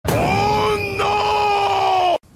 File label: tone